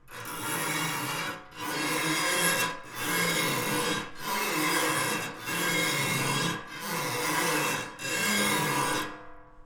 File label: Piano Keys